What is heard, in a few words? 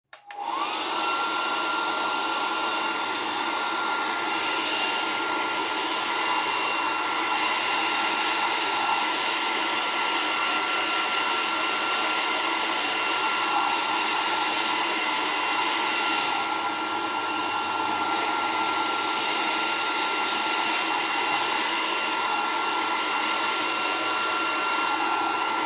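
fen dlya volos, hair dryer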